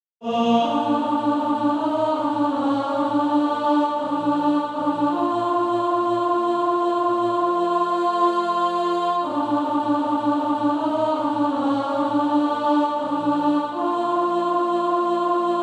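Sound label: alto, Practise Music; SATB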